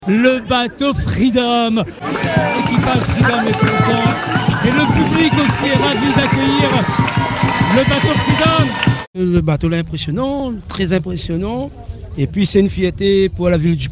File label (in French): Ambiance Bateau; Free Dom accoste au port apres 40 jours de mer; podcast